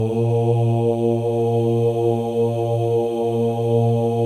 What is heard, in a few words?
male ohs; Spectrasonics; Symphony of Voices